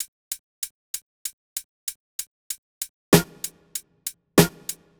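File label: Drums, Hip Hop Exotica, 096 A minor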